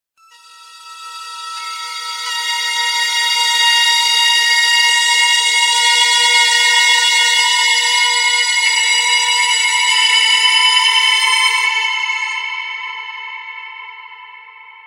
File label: Eerie